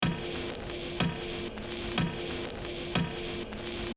Bed of sounds; 10 track starters

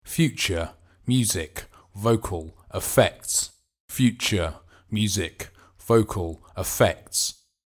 Original vocal

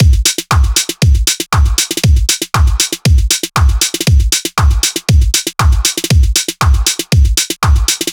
Drums 118

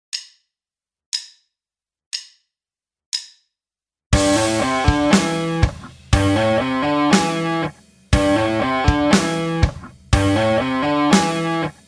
pentatonics, guitar; 30 day guitar challenge

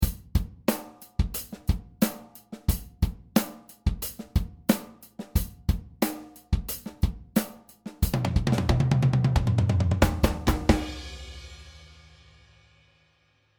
Crash with kick and snare

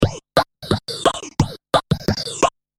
Breakbeat 175, drumnbass

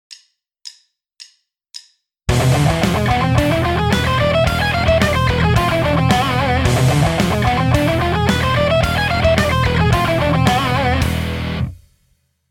Alt picking shred lick; 30 day guitar challenge

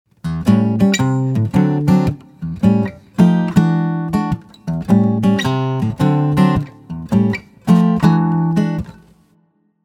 Blues chords example; 30 acoustic chords

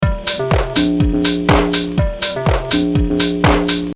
Bed with beat; 10 track starters

